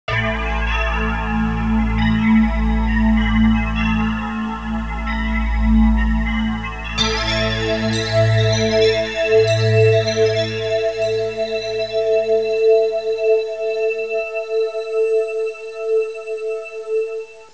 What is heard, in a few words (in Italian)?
audio suonerie musica mistica